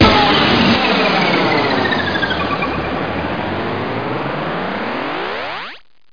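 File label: power down; wnflash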